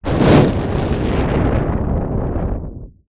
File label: Fireball Flame Effect; audio hero